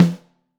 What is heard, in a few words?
Top, Snare; Ableton Foundation FreePacks; Snare Designer